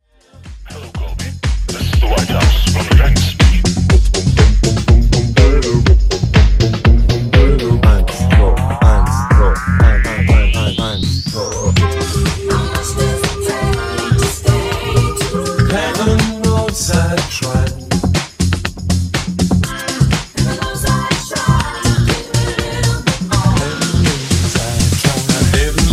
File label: Funk Remix 122 Bpm